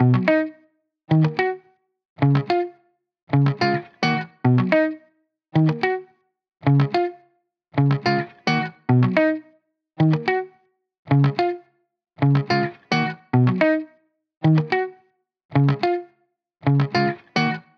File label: 108 BPM C# Min Chords, Guitar; Loop Stems; Cymatics; California